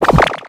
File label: butchered step